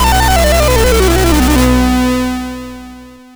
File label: sid CSG8580R5; hvsc